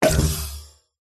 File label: playful game pop off single glitch robotic technology hi tech futuristic game tone; science; ufo